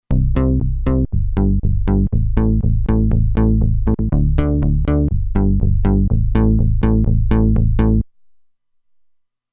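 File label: Pulse Oct Bass, Roland Alpha Juno-2; Factory 2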